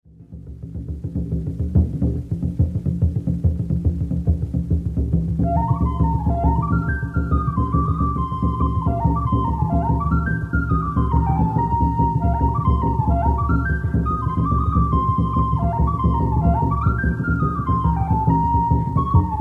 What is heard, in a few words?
bodhran